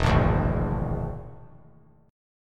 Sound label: E 7th Flat 5th, down; E7b5; strummed